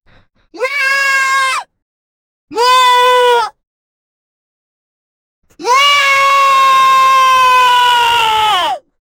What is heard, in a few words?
goblin screams fly away